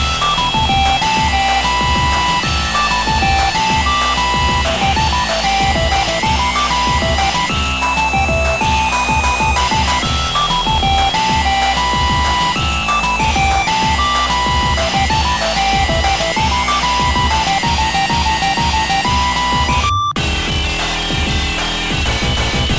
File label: 8bit